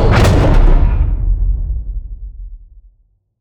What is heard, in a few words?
step; skibidi toilet emperor